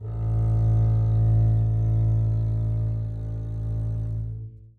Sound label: a1 down, bass arco; meatbass